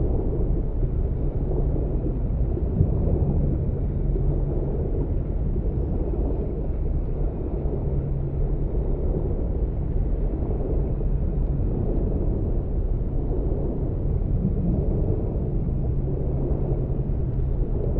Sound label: ship destroyer idle; aquatics